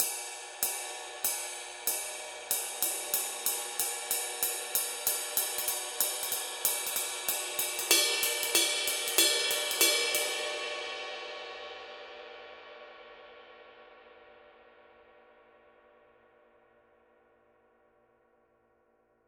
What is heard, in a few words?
21 dark dry ride pattern; paiste; masters